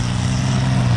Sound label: daimler idle